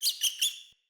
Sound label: Rat Squeak; Ambient; Vocals; Verminia Messenger Rat Squeak D; Animals